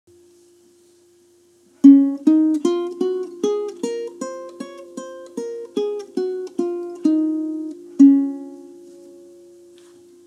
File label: Scales, ukulele